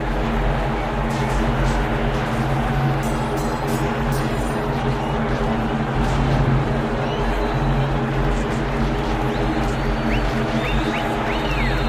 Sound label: Amb Coloseum; Ladianes